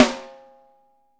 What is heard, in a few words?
6X12 WOOD